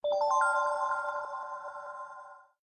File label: uplifting dream notification sound